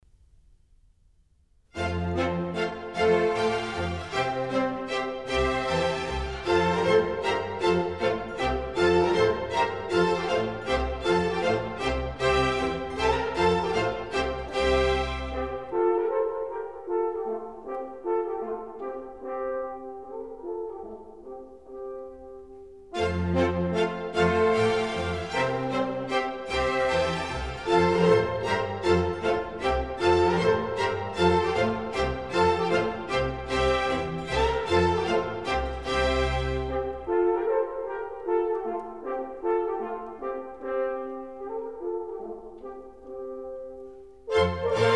Water Music Suite

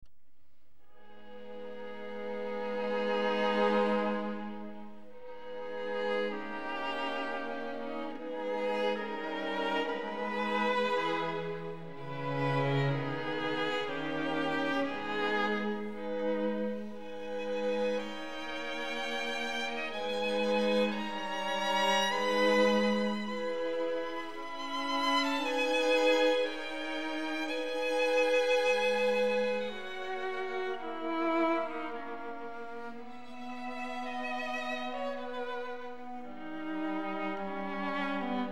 String Quartet in C-Sharp Minor, Adagio; String Quartet No. 14; Op. 131; quasi un poco andante; Ludwig van Beethoven; Beethoven - String Quartets Nos. 1-16

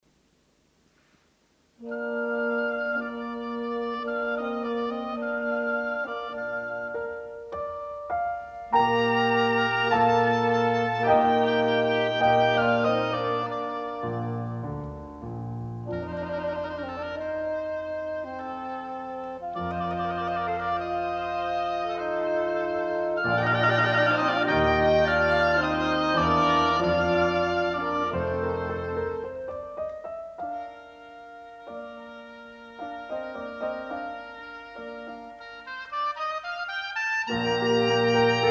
Quintet in E flat for Piano and W; Mozart Horn Concertos

